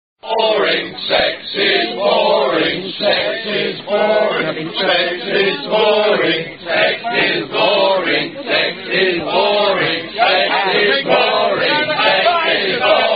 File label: Sung In A Round; Sex Is Boring